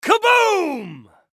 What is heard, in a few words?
Kaboom